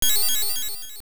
Magic Powder; Throwing Magic Powder

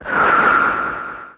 Launch; A player launched into the air (weakest to strongest)

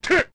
Deflect; Ganondorf

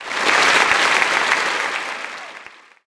Crowd Applaud

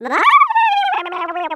Cheer; Mother Maiamai cheering; zelda

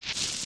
Scarecrow Shake; zelda; OOT

